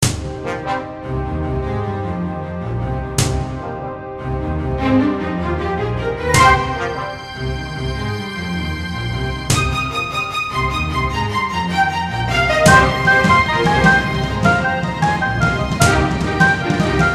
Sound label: Dreamy Drama Groove